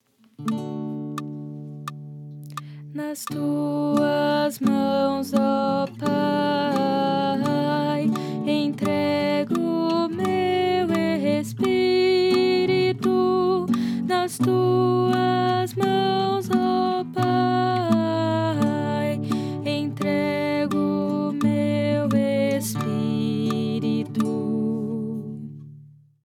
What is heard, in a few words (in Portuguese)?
Contralto